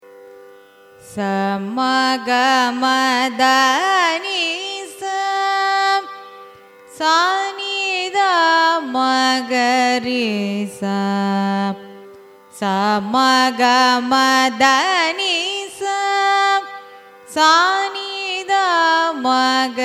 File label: raga vasantha arohanam avarohanam; carnatic music